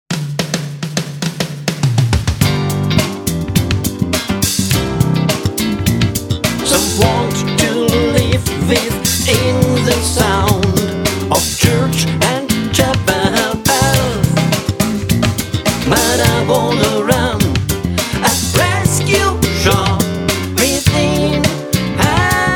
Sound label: music chapel bells